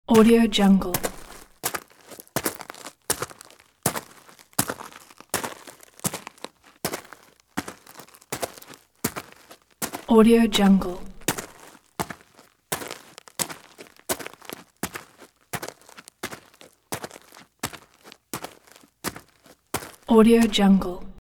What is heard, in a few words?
male in business shoes fast walk on pea gravel; sound ideas